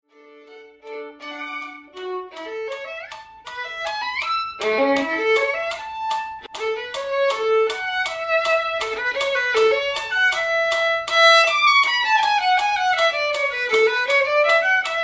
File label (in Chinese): 第四號學生協奏曲 第三樂章 小提琴 示範演奏; 賽滋; 新版 篠崎小提琴教本 第三冊